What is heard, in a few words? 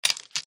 click tile